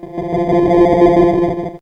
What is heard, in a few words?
fx electronic; Cartoon - film effects